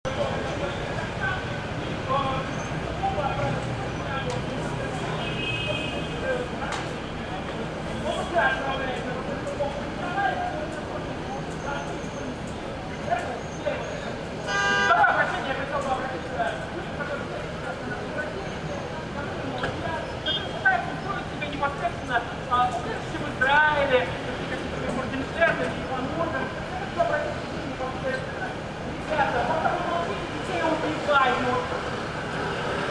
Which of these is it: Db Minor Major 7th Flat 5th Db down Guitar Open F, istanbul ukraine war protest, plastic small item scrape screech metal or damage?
istanbul ukraine war protest